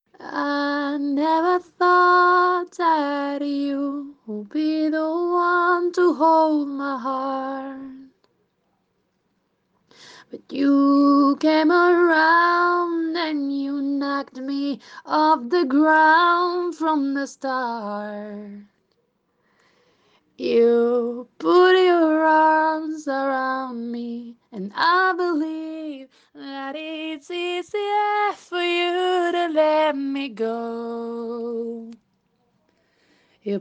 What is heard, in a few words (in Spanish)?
chica cantando